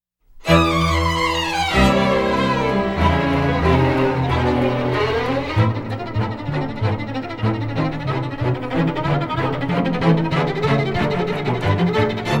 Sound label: crash flight of the bumblebee; kdenlive; media samples